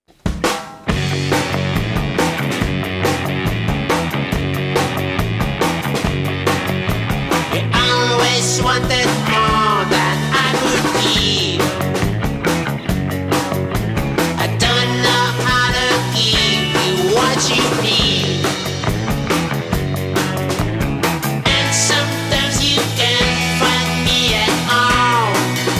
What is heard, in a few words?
thunderbeats